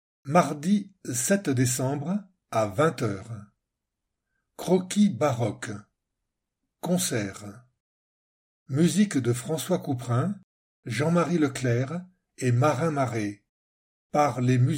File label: croquis baroque